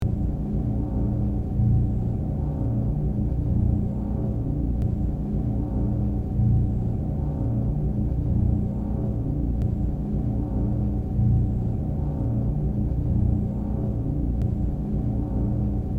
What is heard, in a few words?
engineering, ships rooms